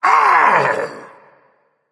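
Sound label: non verbal ouch, voice; cernunnos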